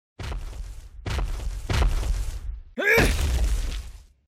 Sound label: Pow Stomp; Goddess of Trampling